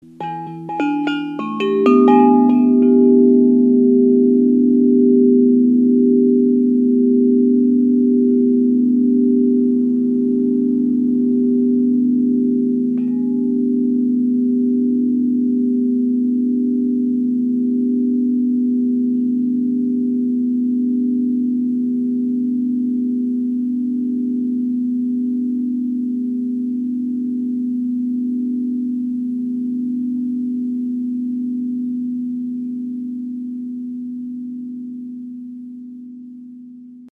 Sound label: Contra Bass, windchime